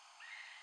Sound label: Thrush